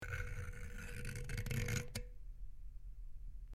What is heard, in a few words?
Dial Switch Metal